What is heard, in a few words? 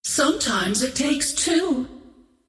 scream count; Queen of Pain